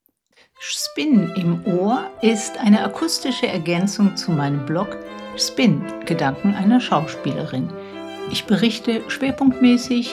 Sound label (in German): trailer musik; schspin im ohr gedanken einer schauspielerin